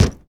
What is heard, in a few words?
Plane P40 Hit Bang Canopy; Airplanes